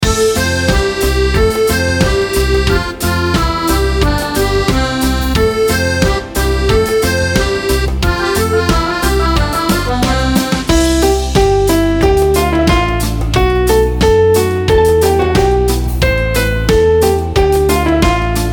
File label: karaoke